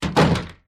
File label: door close, Vehicles